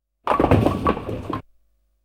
Bowling Strike; KMP Film Video Sound Effects

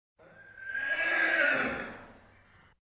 Animal effects snorts grunts farm ambience

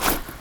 sand

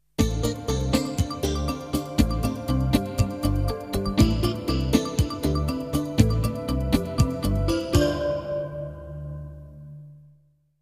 Jingles; 90 DDD Jingles

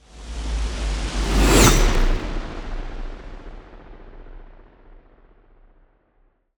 Ice Spell Whoosh, Cold Whooshes; Arctic Aura; Snowflake

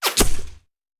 Bullet Impact; Sound Ideas; Guns

